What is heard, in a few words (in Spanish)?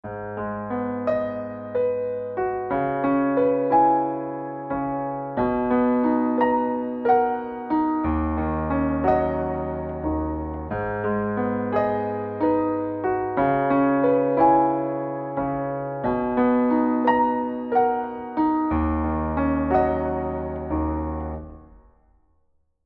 Piano Sonido